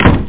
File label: smack doo